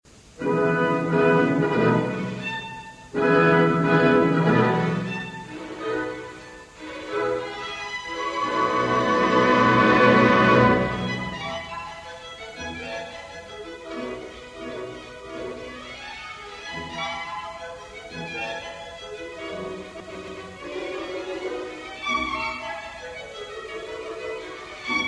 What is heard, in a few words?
mendelsohn violin concerto; Concierto para violín, 3er mvt